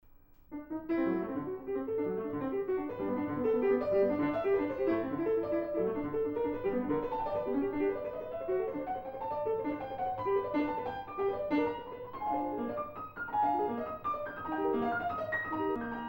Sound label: Liszt Faust Waltz